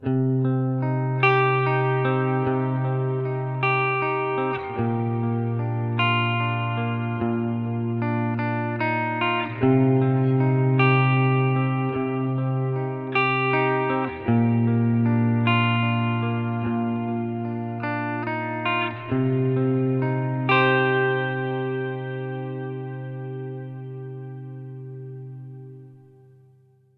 Ultraplate; Meris Mercury X

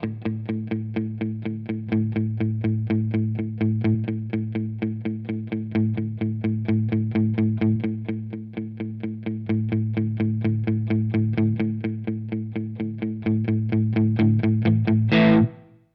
Space Charged V2 Tight Fat; Crazy Tube Circuits

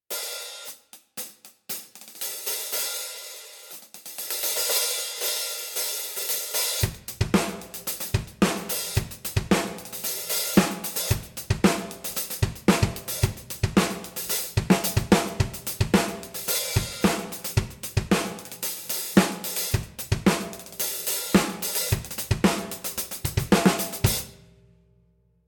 hihat

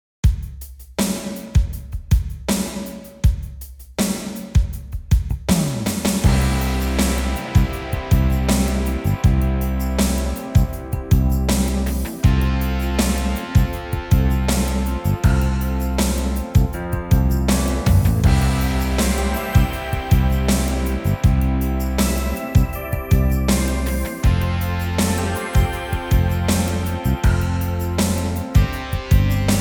90s Swedish Pop Ballad; Genos V2 Superior Pack

